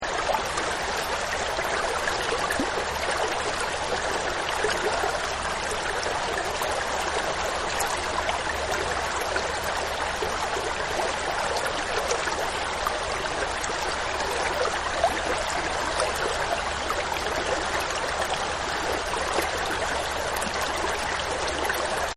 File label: Spring Water Birds; Nature Scenes; Environmental